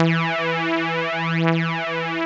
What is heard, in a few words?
phase me E2; Best Service Alpha Dance vol.2